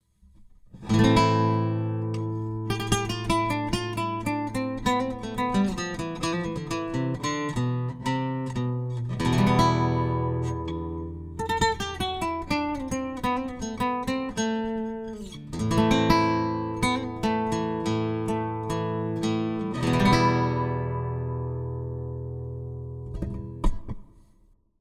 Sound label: Fishman Aura Spectrum Nylon Picking Blend Comp; LaMancha Rubi